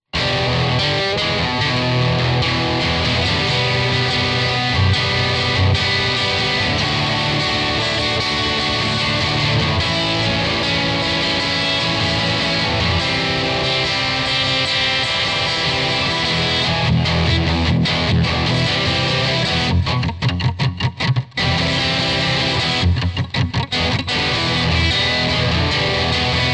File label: electric